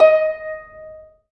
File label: STR HARP, STR Orchestral; Sound & Vision; Gigapack I CD 2